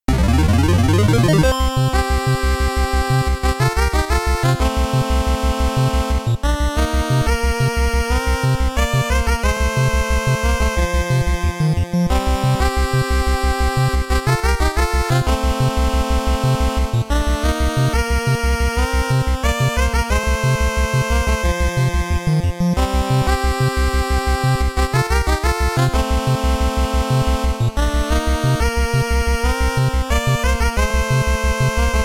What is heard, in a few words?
Mini Fairy Battle; ZeroRanger